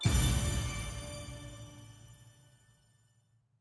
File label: little Win; archangels